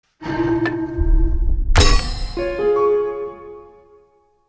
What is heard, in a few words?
Achievement; Ulysses; Scarlet Hood and the Wicked Wood